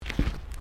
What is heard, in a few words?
Footsteps Decking Wooden Step; Crocs; Footstep Foley; Human